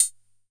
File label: KR55 KIT; INIS - Funky Xtreams